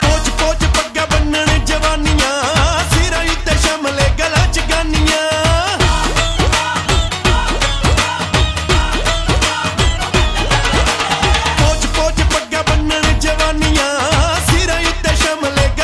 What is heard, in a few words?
Punjabi